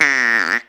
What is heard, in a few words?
Duck call, cartoon Foley Fx; musicradar; cartoon caper